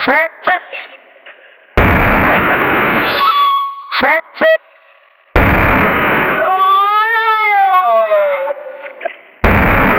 running footsteps; sound running footsteps h

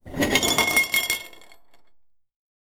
movement

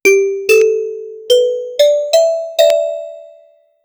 challenge is approaching

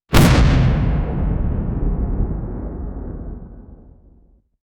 massive explosion boom co, comical

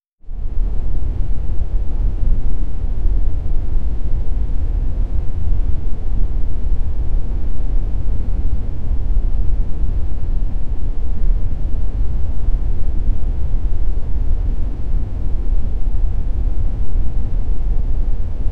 a continuous sustained, superhero

